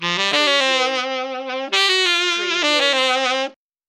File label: Sax Riff